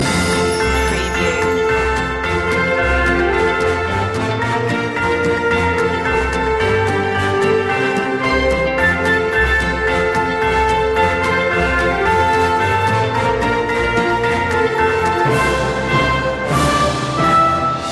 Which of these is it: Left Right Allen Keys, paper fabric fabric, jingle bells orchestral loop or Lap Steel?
jingle bells orchestral loop